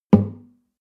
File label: nylon guitar drum single tonal